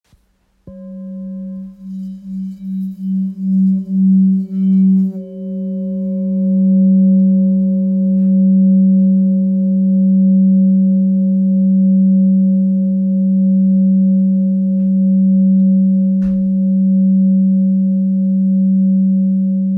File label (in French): Bol bengale fa